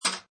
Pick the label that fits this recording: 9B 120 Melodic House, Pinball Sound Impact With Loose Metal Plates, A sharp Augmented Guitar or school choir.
Pinball Sound Impact With Loose Metal Plates